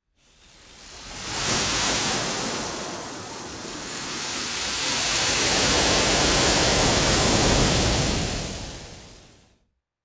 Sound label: two wind gusts rustling through leaves